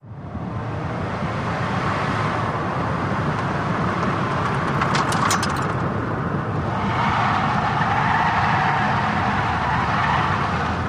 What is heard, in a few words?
Sedan Accel Corner